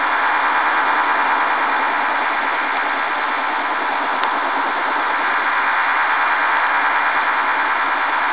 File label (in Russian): kamaz